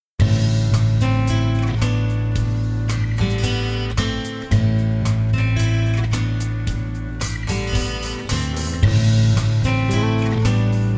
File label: rock and roll